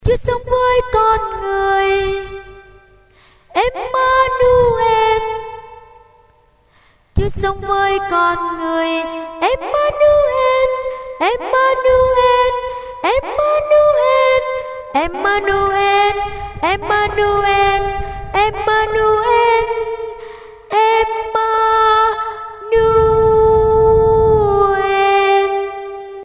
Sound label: tenor